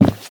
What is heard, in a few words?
Footstep wood